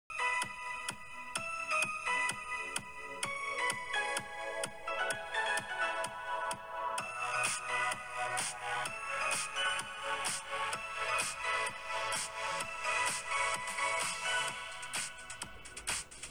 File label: cheerful music